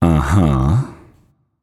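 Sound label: non verbal agree, voice; cernunnos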